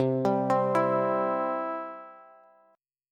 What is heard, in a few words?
C Major C down Bass; arpeggiated; chord; JGuitar